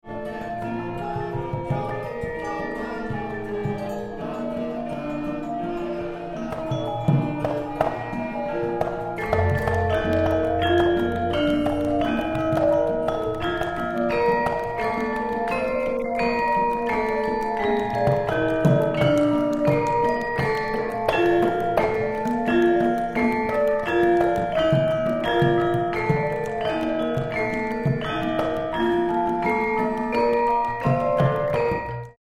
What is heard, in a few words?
gamelan bondhet kebar soft to loud